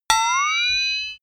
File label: boing flexatone